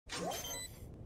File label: defusing sound; spike defuse; valorant spike defuse sound effect; made with Voicemod